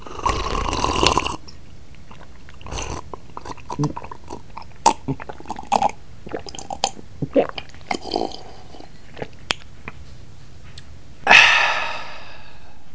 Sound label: drinking